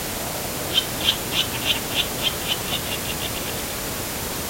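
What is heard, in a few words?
Virginia rail grunt; National Marsh Bird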